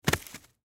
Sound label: Rope Drop, Rope Drop Dirt Toss; Rodeo Sound Effects; Backgrounds; Foley; Blastwave